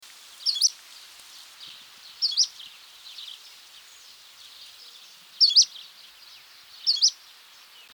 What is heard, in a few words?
Humes Leaf Warbler call; A. Al-Sirhan